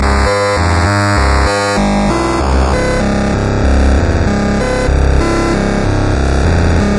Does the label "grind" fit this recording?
Yes